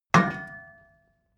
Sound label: metal impact bucket solid